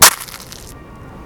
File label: Cone Crush